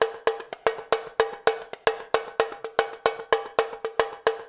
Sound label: loop, world percussion; InVision Interactive; Percussion Slam